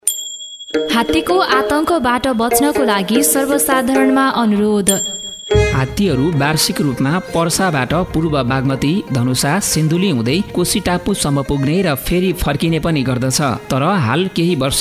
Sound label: ELEPHANT AWERNESS JINGLE